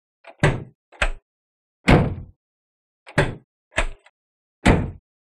Automobile; Trunk; Car Boot ( Trunk ) Open And Close.